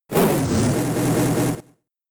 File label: Fire Spin